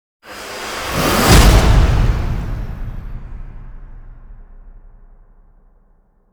swish

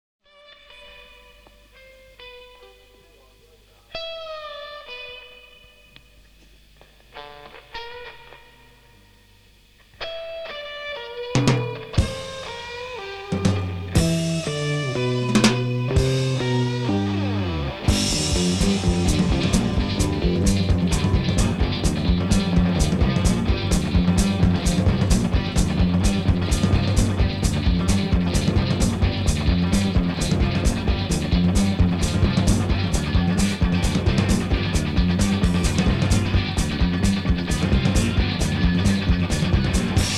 stereo instrumental version

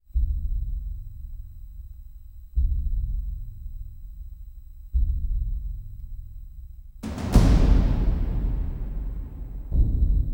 drums

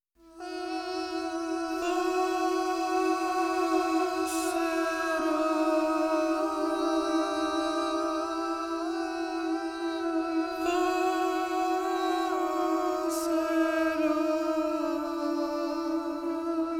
instrumental

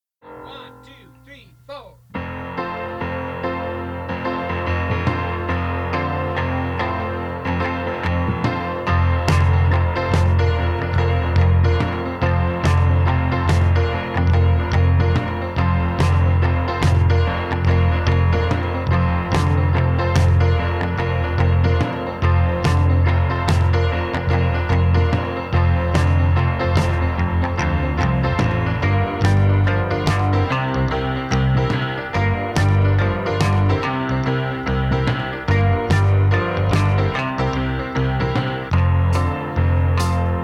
backing track instrumental

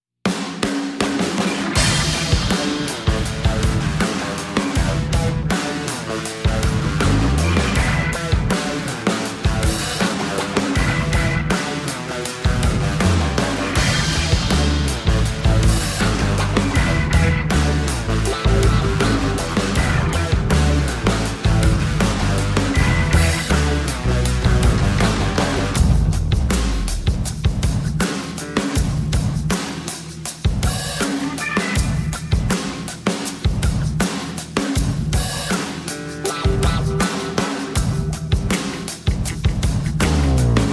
no dubstep synth

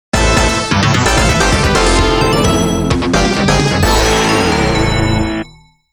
game over

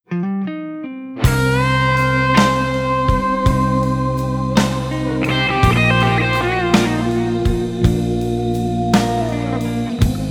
guitar

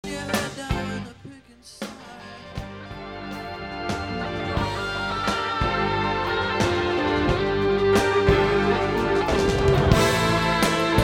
guitar solo

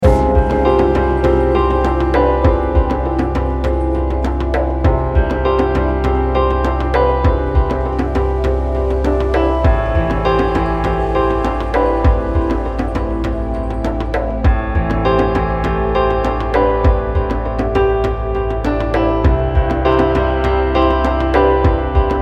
rainy day percussion chill

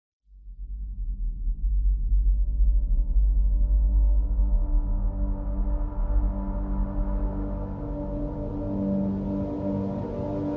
ambient

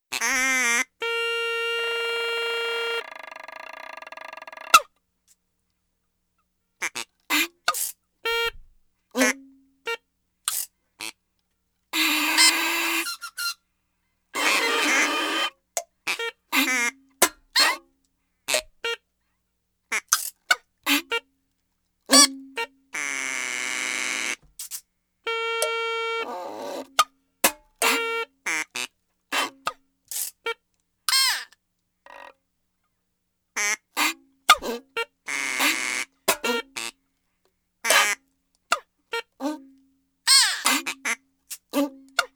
acoustic version

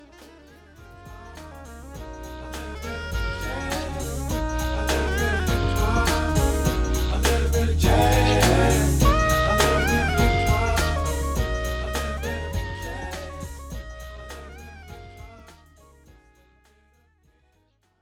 hip hop jazz interlude

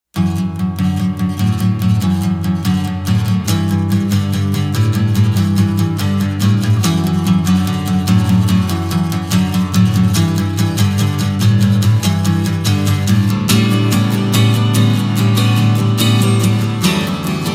acoustic version

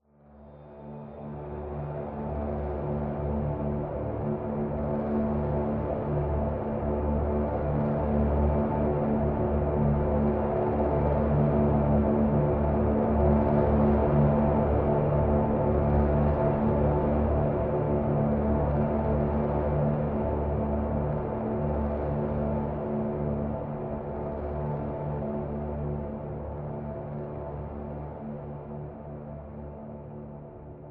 ambient

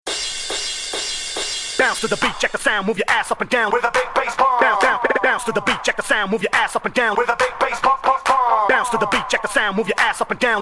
acapella

